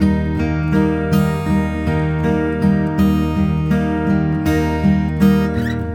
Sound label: Acoustic Guitar; Guitars